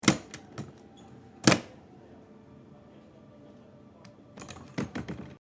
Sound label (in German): S Bahn Fensterklappe